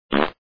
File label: fart